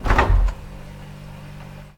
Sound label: fridge open; Singles; French Version